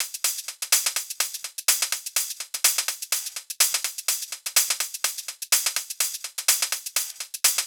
Hh Tm Loop 125; Hh-Shake Loops 125; Equipped Music; Loungin House

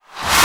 Reverse FX

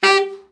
BRA TENOR SN, SAX

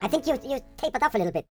YODEL YELL, World Voices

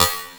SD, Drum & Bass, 160 BPM

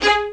Spicato Basses & Celli

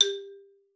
G3, xylophone; Xsample VOL-4

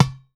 SKINNED UDU

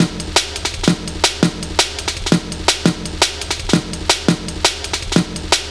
LOOP, Explosive Jungle

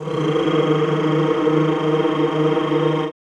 CHO Rrrr

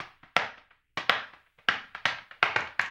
HAMBONE, Vocal; Blues & Soul

